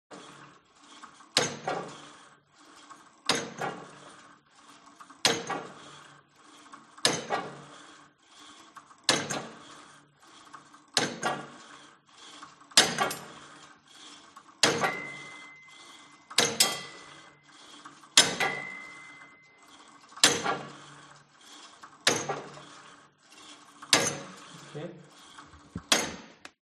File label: SPORT GYM Cable Machine Old Pull And Drop Sequence Hard Long; smartsound